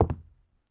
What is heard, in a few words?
pl wood, player; cstrike